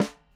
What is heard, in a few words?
Hammer A, Dimensional Snare; Airwindows